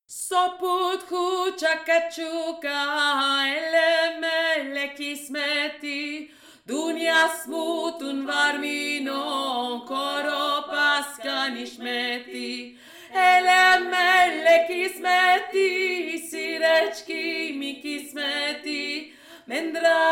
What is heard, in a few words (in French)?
VOIX 2 PRINCIPALE